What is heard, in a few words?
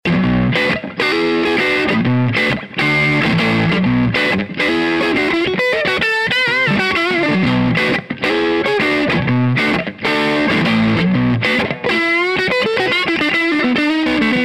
blues strat